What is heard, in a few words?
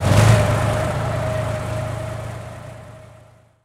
t90a engine load; engines; War Thunder